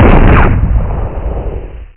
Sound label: PISTOL; Macworld (1997-11); Games World; Additional game levels; Duke Nukem 3D